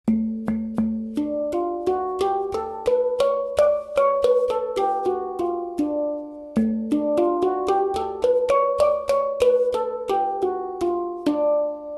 Huzam, hang

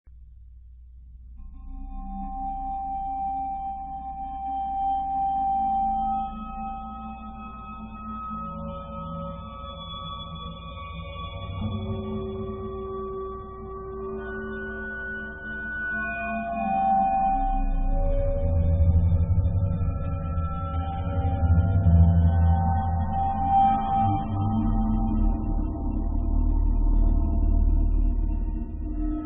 violimba

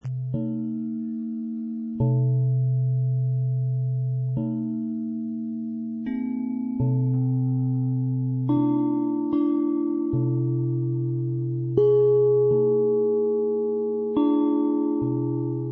gongs

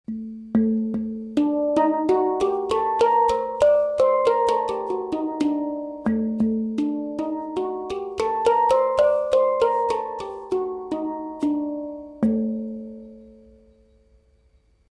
Hitzaz, hang

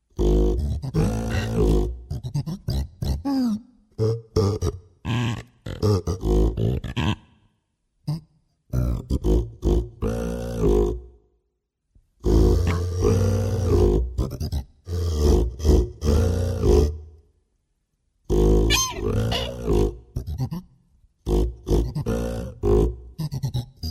daxophone

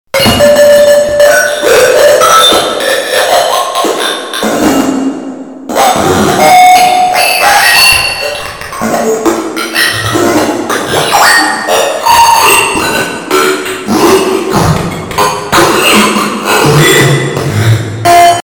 incantor circuit-bending

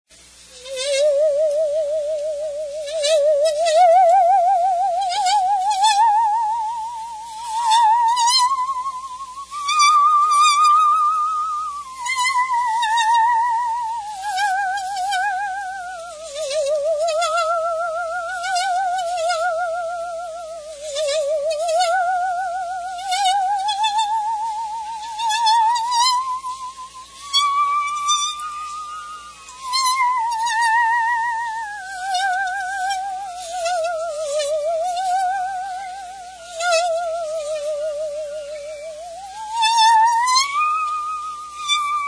musical saw

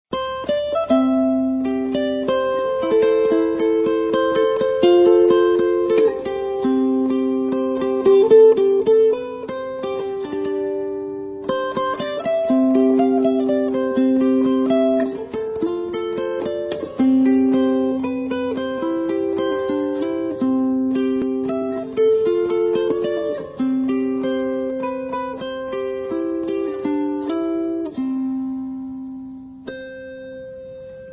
dulcimer

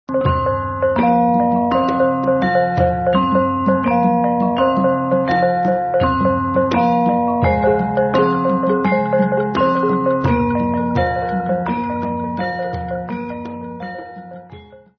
bonang

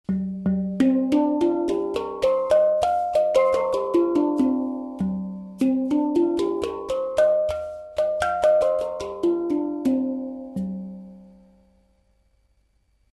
Slendro, hang

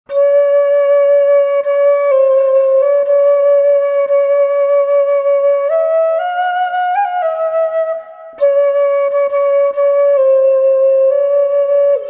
ring flute

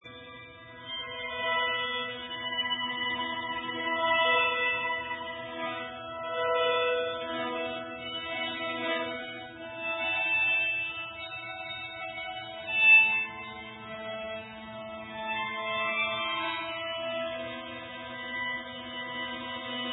singstones

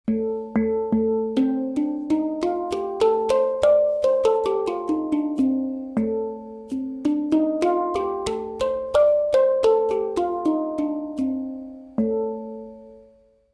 Sho, hang

